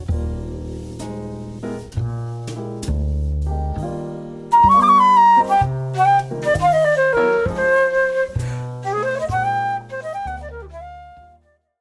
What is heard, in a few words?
tonic major7